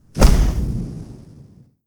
Explosion

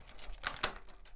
Door Unlock

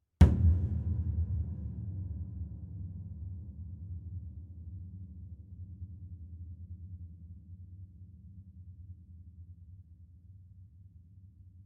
Piano Hits Hand

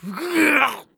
Man Struggle

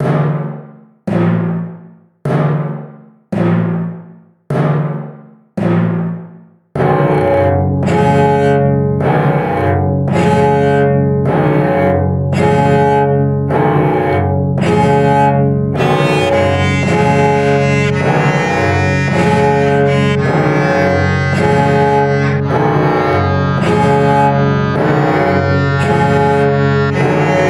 Marching Tune

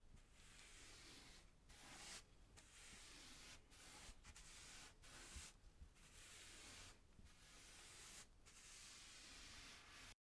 Wall Painting

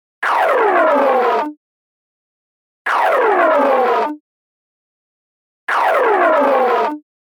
Growing Pipe

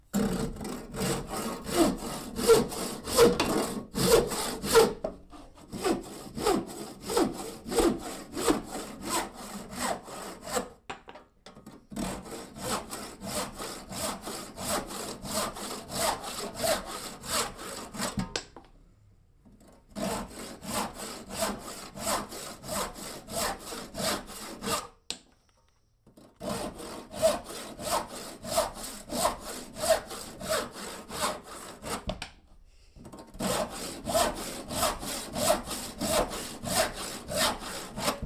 Carpentry Sawing Timber With Handsaw

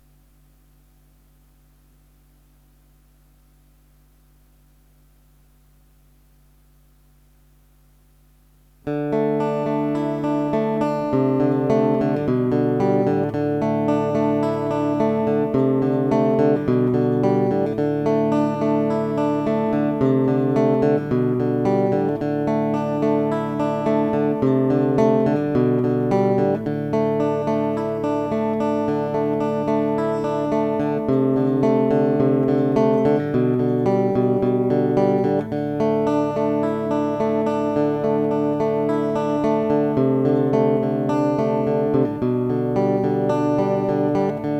Picked Guitar

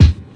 Drum

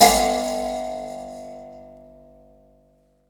Spring

